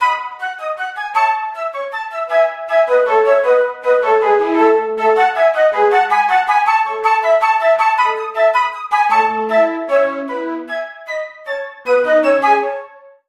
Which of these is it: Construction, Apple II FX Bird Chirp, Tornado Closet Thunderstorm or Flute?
Flute